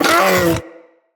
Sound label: Vocal Pain, Hunting Critters; Characters; Predasite